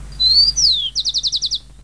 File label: recorded bird